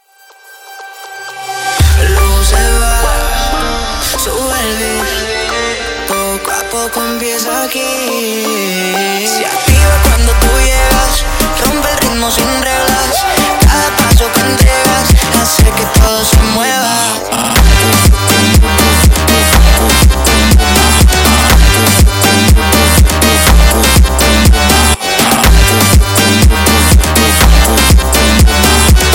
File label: sped Up, funk